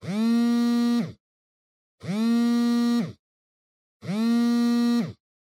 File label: Cell Phone Vibration Cushion